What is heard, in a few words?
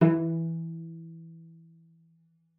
cello pizz E3; 90 s Sample CDs; Xsample VOL-5